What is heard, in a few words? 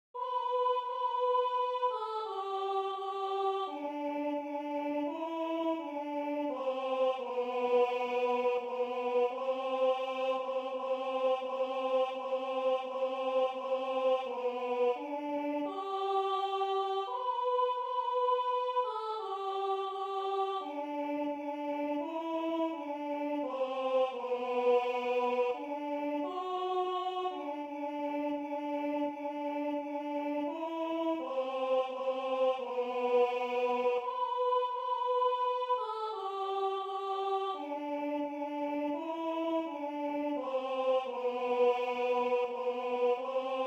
Alto; Away in a Manger